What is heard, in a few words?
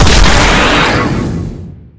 demon hit